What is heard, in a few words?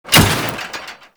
Restrained Foley, Worker; Warframe; Characters; Solaris